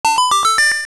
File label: Kinstone Fuse Jingle; Successful Kinstone fusion